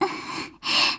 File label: laugh; Eternal Return; Tia; JPN